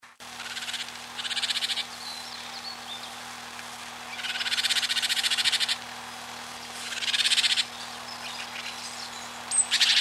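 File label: Parus major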